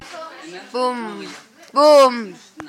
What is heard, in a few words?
haha